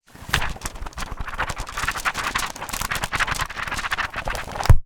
magic generic haunted old grimoire open flip through close; sonniss gdc